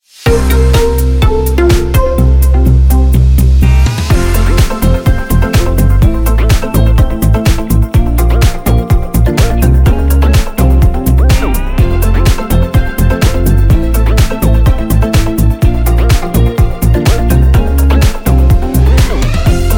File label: Sunny